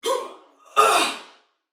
Death, Female, Soldier; Dialog; Barks; Battle; Sinecure

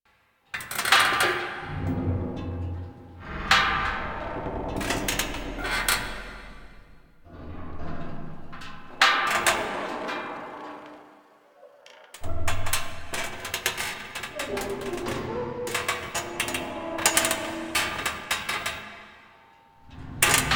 Metal Crate Creaks Loop; Warframe; The New War; Narmer Fortuna

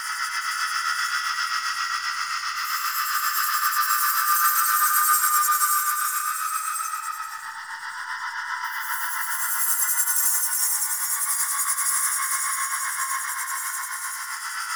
Mine Loop Positional; Warframe

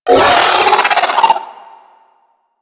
Vocal Death, Enemies; Warframe; Riot Moa

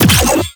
Detron Power Layer B; Warframe; Weapons; Corpus; Lich

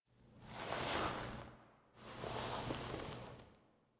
Foley Operator Hand Look; Warframe; Orbiter Nursery

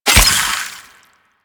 Infested Ashen Spike Fire; Warframe

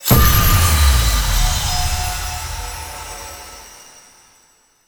Choice Menu Confirm; Warframe; Jade Shadows; Quests; Ending Diorama

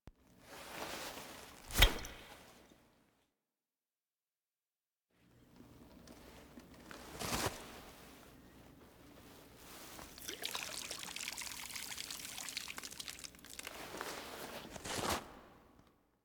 Operator Foley Empty Kuva; The War Within

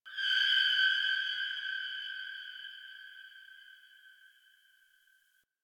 Sentient Lair Spirit Chatter, Ambience

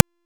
Signature Pistol Last Shot; Warframe; Tenno; Temple

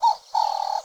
Forest Open Glade Bird Close, Day

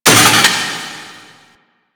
Vaults Stake Gate; Warframe; Entrati

